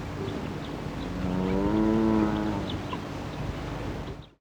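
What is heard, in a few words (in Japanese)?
kirin, キリン 鳴き声; ほとんど声を出しませんが、うなり声や鼻をならすような声など、さまざまな声を出すことがたまにあります。; 上野動物園; 子どもはときに、メェーというような声を出すことが知られています。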